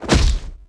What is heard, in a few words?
kick; Tony Hawk's Project 8; XBOX